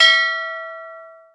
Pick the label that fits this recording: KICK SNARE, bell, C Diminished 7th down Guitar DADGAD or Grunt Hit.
bell